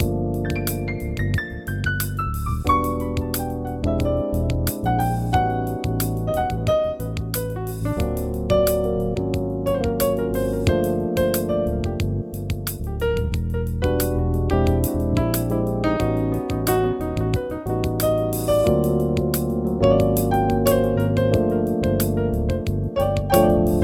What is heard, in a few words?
piano